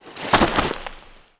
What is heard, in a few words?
foot prone dirt, footsteps